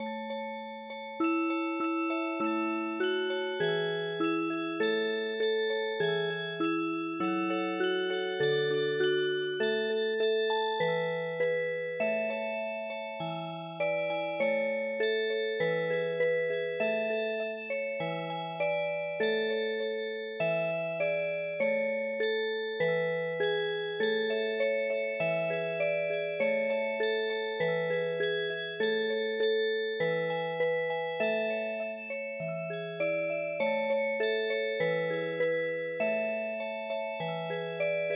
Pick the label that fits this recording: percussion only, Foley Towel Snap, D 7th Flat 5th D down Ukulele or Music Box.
Music Box